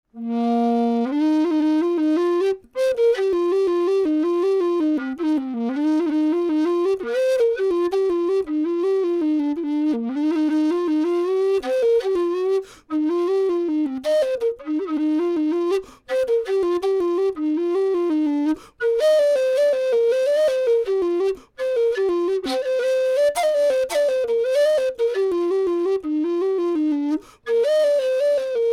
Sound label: Whistle Low Bb Jig